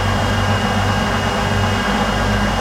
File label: tank idle